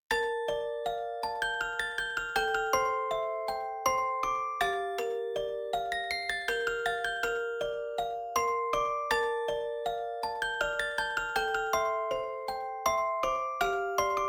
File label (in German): Orgel